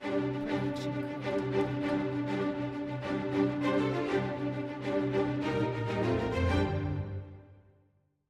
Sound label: serious strings jingle; soundscrate